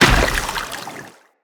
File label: Moa Combined Step Water, Footsteps